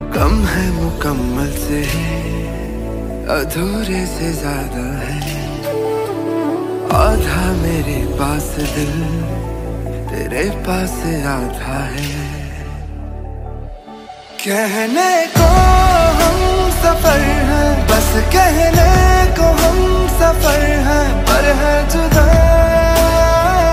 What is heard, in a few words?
Ringtone